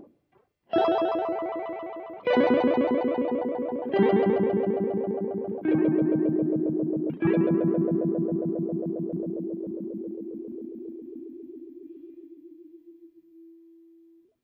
Harmo Arp; Beetronics Seabee

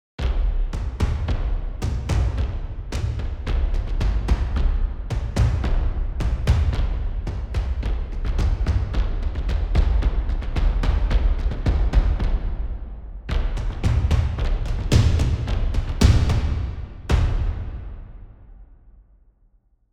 Toms of Steel; Strikeforce

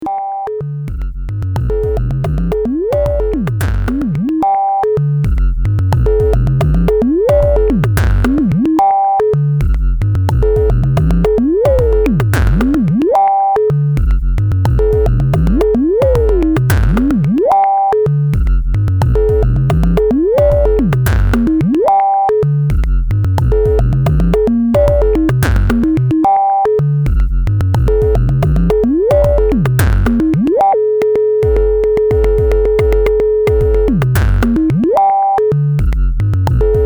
electro nic

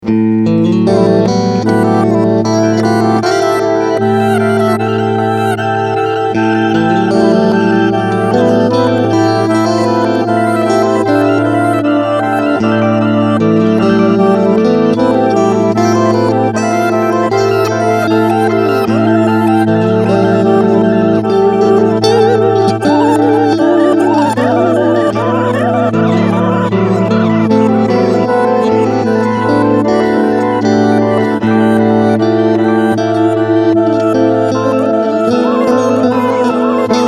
Reverse Delay

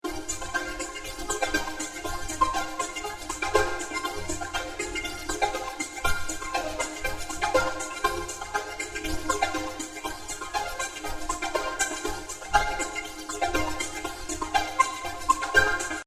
Vocoder Talking Flutes

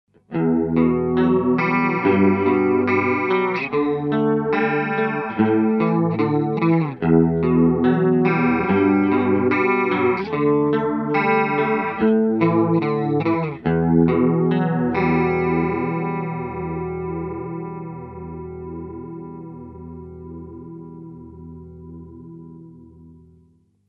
Chorus Sound B

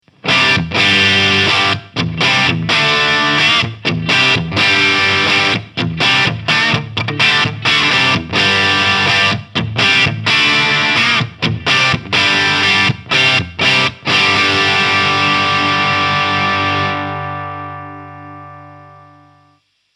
Crunch Single Coil; Chapman Guitars Bea

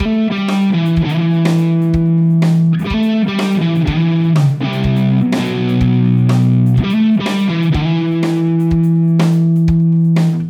Schecter Sun Valley Shredder Both

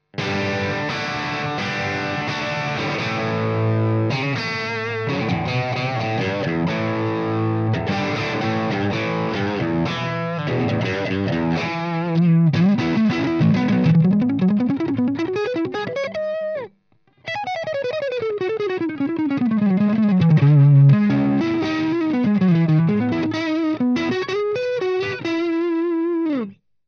Strat high gain

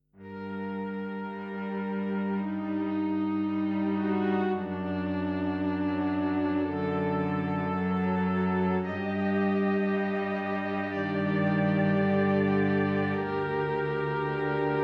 Strings

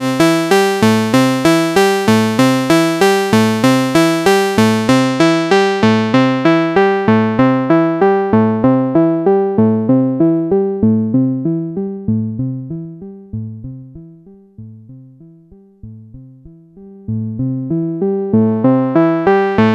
Filter LP; Bastl Thyme

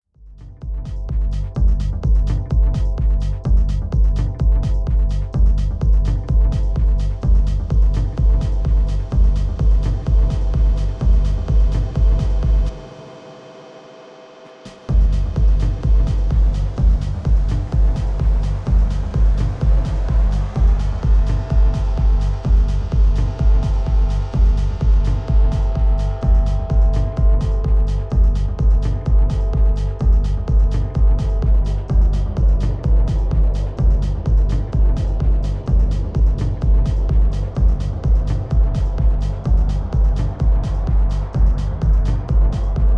Pioneer DJS 1000 Plate Rev

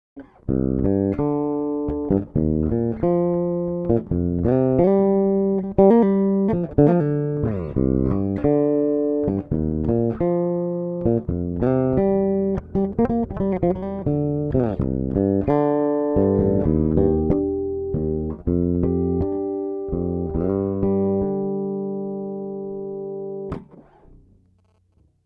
Marleaux Bridge PU Fingerstyle

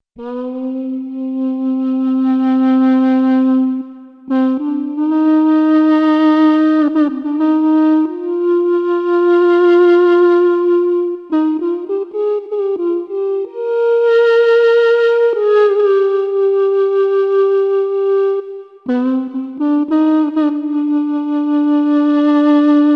Tiny Reed; Cypher2; FXpansion

